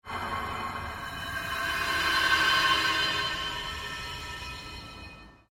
pulse long sweep, Sound effects created by manipulating other sounds; Sweepers